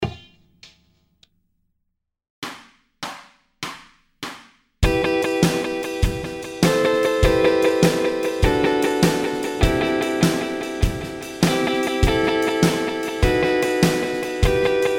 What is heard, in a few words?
Riff, guitar